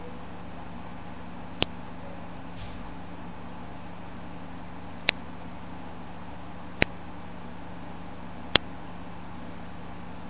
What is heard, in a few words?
Logitech Clicks; linux kernel